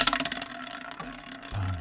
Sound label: spin wheel sound